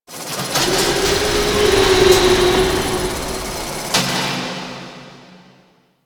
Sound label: Mining Machines, Asteroid; Ambience; Warframe; Grineer Asteroid